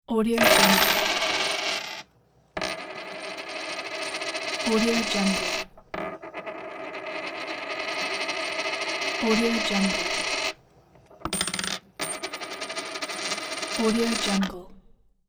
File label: coins